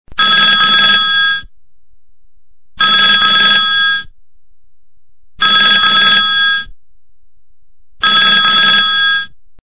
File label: Old phone